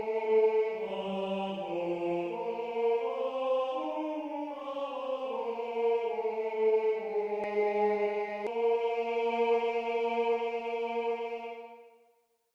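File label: baritone, barbershop tags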